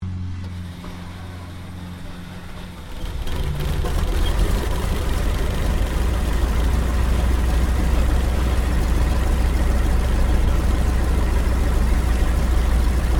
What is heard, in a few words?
AIRCRAFT CAC WIRRAWAY STARTUP INTERIOR STEREO; CA-16 Wirraway; Airplanes